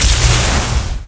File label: Magic